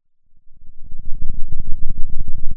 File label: Sound of a thunderstorm, center